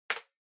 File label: PC Keyboard Far Enter Hard